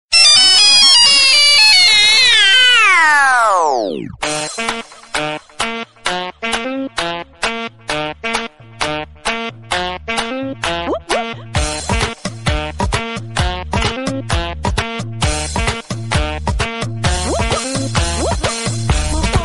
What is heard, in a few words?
nokia german ringtone